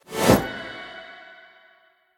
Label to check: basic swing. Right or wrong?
Right